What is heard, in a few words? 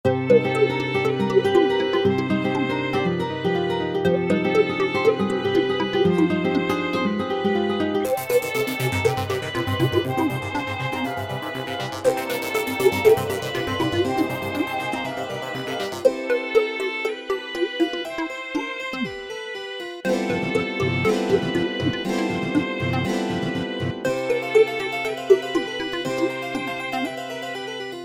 Calming River, This piece is catchy and has a nice ring to it, it was made in Garageband and loops; Liberated Pixel Cup